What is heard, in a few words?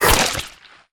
Web Shot; Lurker; Evolve; Gorgon